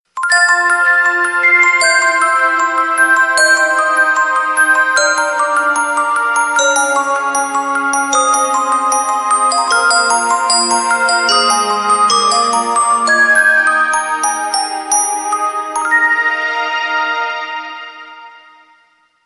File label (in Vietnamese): Nhạc chuông báo thức nhẹ nhàng